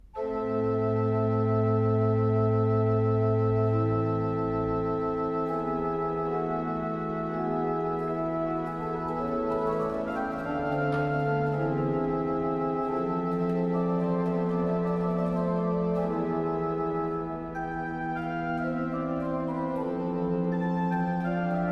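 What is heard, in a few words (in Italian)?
Intonazione Cromatica, organ; Hermans; Nono Tono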